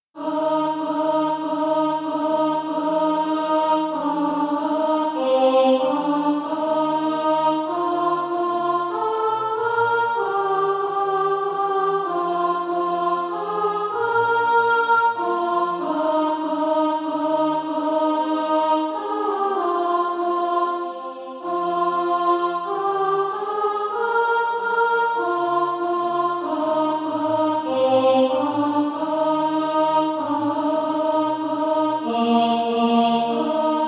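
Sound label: alto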